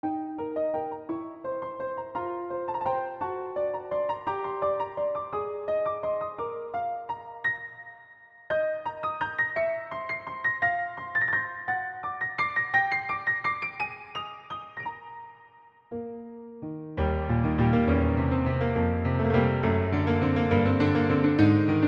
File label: improvisation